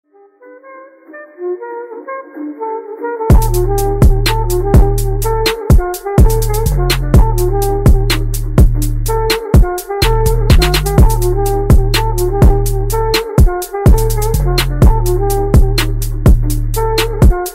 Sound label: Swishy Trap Beat